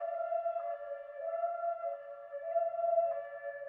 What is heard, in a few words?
Amb Generic; Dirt 4